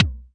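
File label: SC40 bass drum, Pearl SC-40 Kicks; DRUM MACHINES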